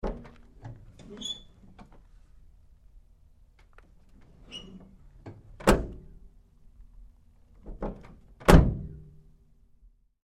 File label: Truck GMC Savana Door Side Open Close Lite; Trucks; 2010 GMC Savana